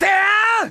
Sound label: scream male; ooh ahh